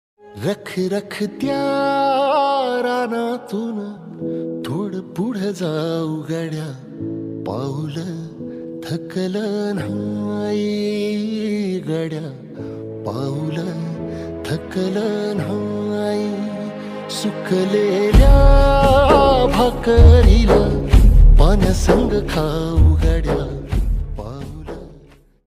marathi ringtone marathi motivation ringtone new instrument marathi ringtone